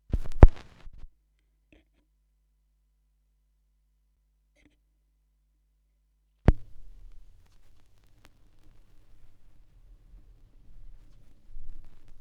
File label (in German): schallplattengeraeusche, Vinyl